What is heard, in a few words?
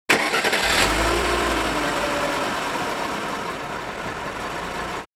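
car engine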